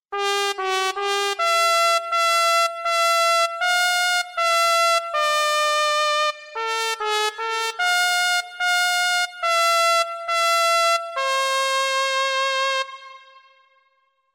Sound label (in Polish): trąbka